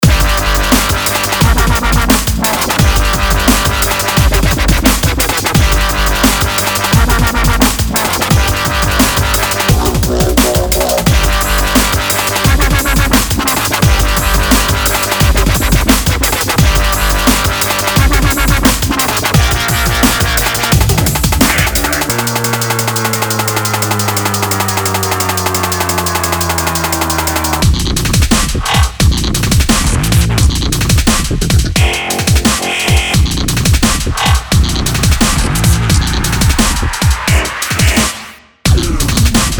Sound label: Drum Step